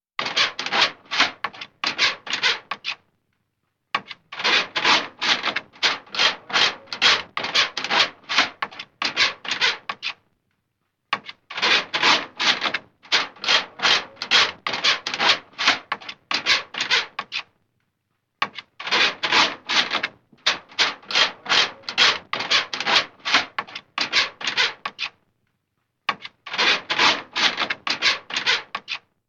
Scratching At The Door; horror sounds; Hollywood Edge

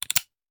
Hand Gun Foley; Sound Ideas; Guns

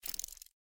Gecko; Blind Quest - The Frost Demon

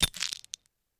Camera Flash Bulb Crackle; Home & Office; 1001 Sound Effects

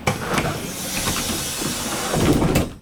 bus door; best service Blue Box

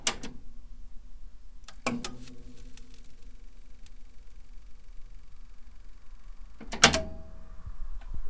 Computer Monitor Power On; SFX Kit; Foley - Misc

